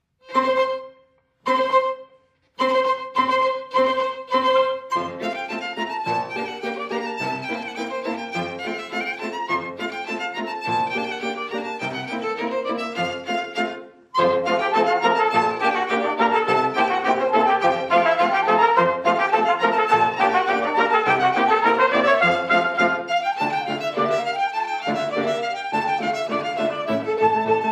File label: Polka